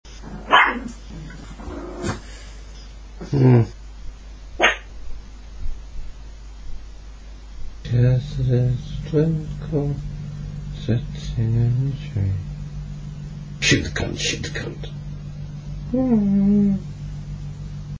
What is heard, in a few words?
twinkle song